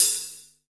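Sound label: Stand Hat open, Fusion Kit; Akai MPC500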